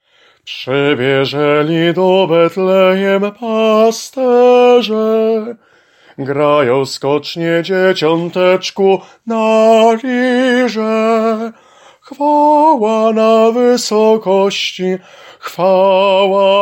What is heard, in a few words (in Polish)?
SOPRAN; Przybiezeli